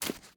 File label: terre de pomme break